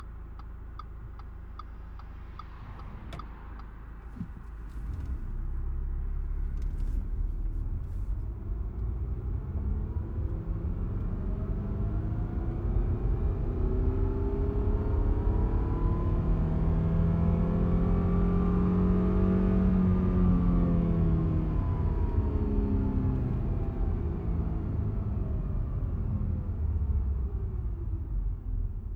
audi a4 allroad 2.0 TFSI engine rpm ramp fast interior; mono; SoundHolder